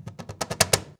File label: CAJ FILL, cajon fill; Univers Sons - Raricussions